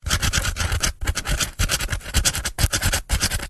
office marker pen writing on dense foam or polystyrene; zapsplat